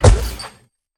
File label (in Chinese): 劫掠兽脚步声